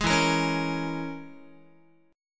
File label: E 6th Flat 5th E down Guitar; E6b5 strummed; JGuitar